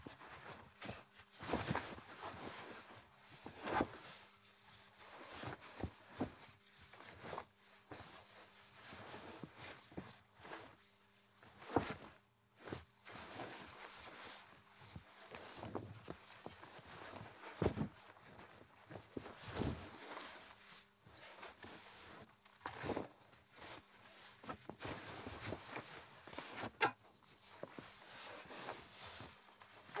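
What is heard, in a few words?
magic of soft movements and fabric sounds; Фоли (шаги, движение ткани — часто совместно с плагинами/семплами)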